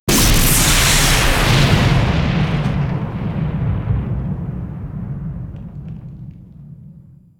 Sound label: Lightning Strike Impact; Evolve; Kraken